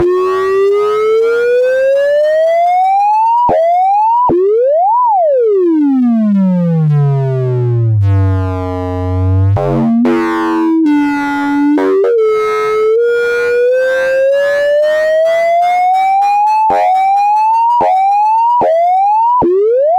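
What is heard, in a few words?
sine osc C bifurk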